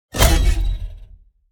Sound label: Metal wires subtle impact